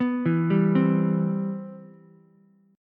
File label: C Major 7th, C, down, Ukulele; arpeggiated; CM7